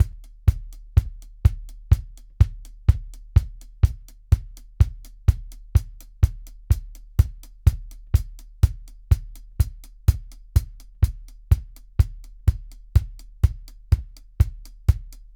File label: 125 Live Drums